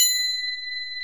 BEL, Orchestral Perc; Roland LCDP03

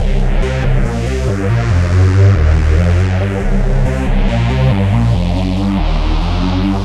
Phase Bass; Dr. Fink's Funk Factory

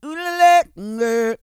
SING, World Voices, CARIBBEAN

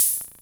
cymb; MTI AO-1

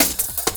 Pickup, Drums & Percussion; Sonic Foundry (Sony Creative Software); Futurist Drum & Bass

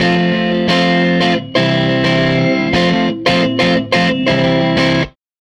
Tele Guitar Loop

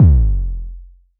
KCK, Roland SH-09 synth drums

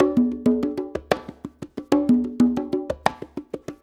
Blues Perc Loop; Drum Tools

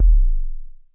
ETOM; Crypton - DrumBase5000; DANCE KIT #3

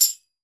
TAMBOURIN

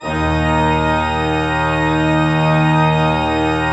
D2, Cathedral Organ; ILIO; Propeller Islands

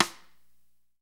NATURAL, NATURAL KIT; Spectrasonics; Burning Grooves CD2

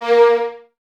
Violins Detache A#3; Miroslav Vitous; String Ensembles

